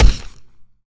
miss wall

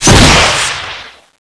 rpg7 shoot; weapons; cstrike

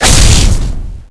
shot hit; weapons; linkgun

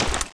start reload; ksg12; weapons; cstrike

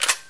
clip out; fnc; weapons; cstrike

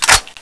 l85 foley, weapons; cstrike

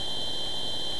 target loop; weapons; vulcanus5

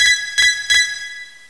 goal

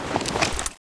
draw; cstrike

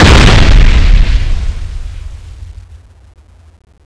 skill exp

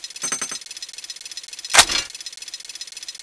scythe reload; weapons; thanatos7

